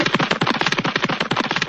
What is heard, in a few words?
cartoonish runnin